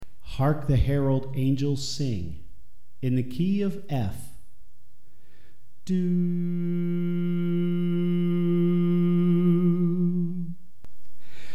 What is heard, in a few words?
Soprano